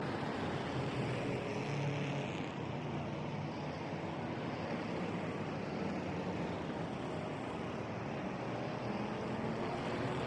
ambience in race; lightning speed

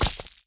object hit concrete; lightning speed

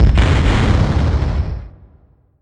ship Big Explosion; incredible hulk chitauri takedown

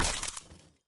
ice block smash; frozen rush

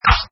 wall hit bat; inlogic-castledefense

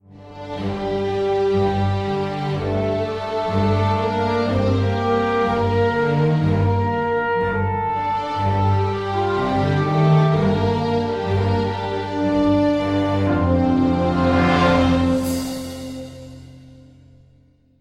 fail; star wars rebels team tactis

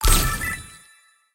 match; YummiCookie; French

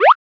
jump; Pingu-&-Friends

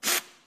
ui btn next kit

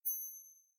shine; Domino

